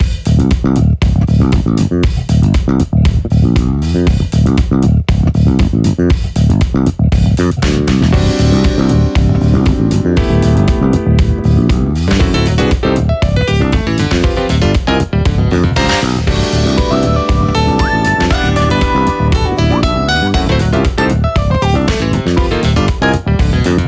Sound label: jazzy morning thang